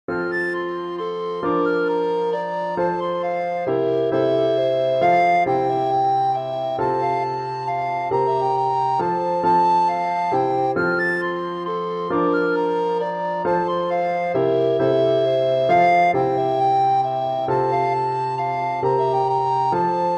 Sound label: minuet recorder duet; Mozart